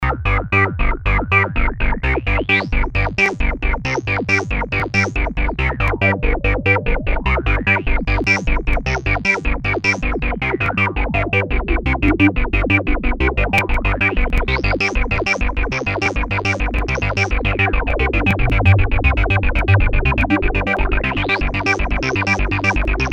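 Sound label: basline, Red Sound Darkstar Desktop digital synthesizer; Speedup 303esh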